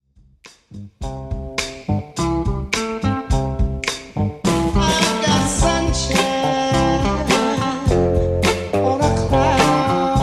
Dj Intro Outro 105 Bpm

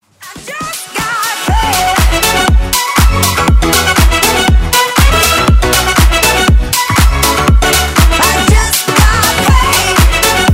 House Redrum

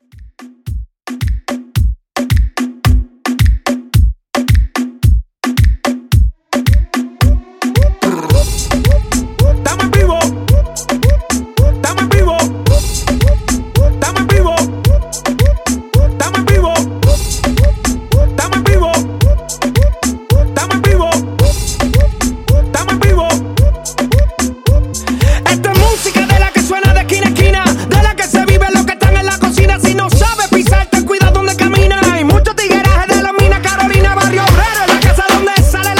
Party Reggaeton 110Bpm

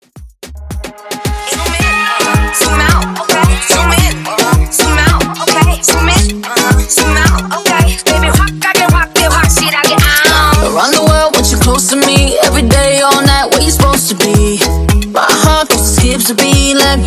Mash Up Moombahton Intro 110 Bpm

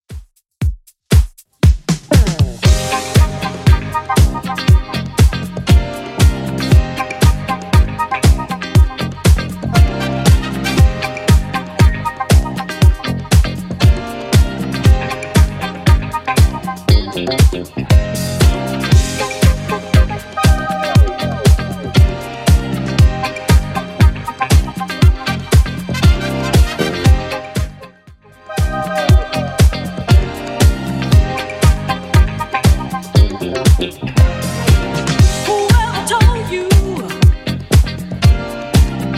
70s Redrum 118bpm CLEAN

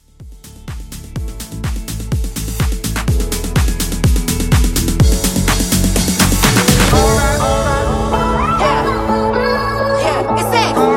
Mashup 125

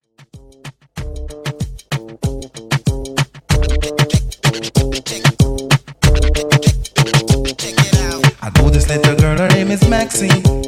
Intro 95 Bpm

